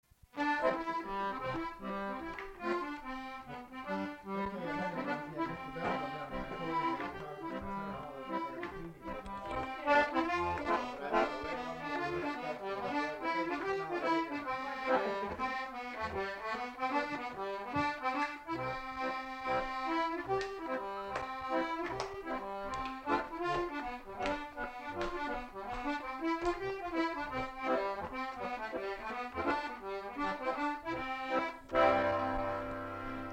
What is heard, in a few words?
accordion